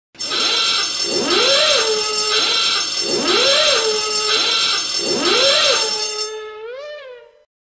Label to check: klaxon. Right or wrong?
Right